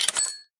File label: buy chest